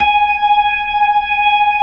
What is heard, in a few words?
B-3 G#6, Jazz; Keyboards of The 60's and 70's